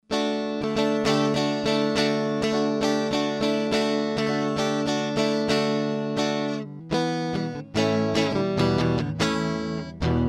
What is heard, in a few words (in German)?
Gitarre via Interface; IK Multimedia iRig Pro Duo IO